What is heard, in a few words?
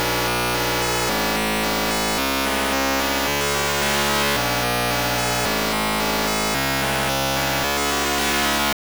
8bit